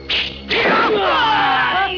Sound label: Fight, 打斗音效; 游戏音效; 特酷声音素材库